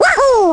baby wahoo; baby mario

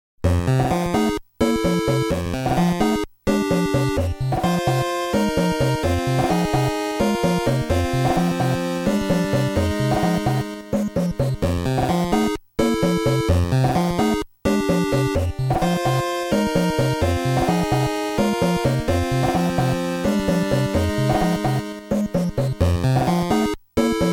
underground

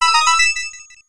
red coin

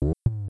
swallow no coin; smw

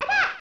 flying wiggler; ta-snes

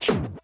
toad house appear; nsmb

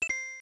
correct; lemmy wendy; smw

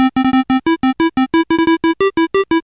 hammer theme; ssbm

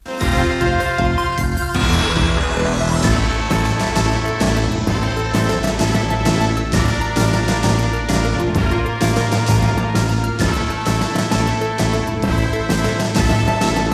ending; taosmb3

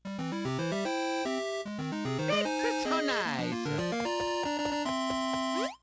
course clear bonus; nsmb

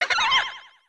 boo dead

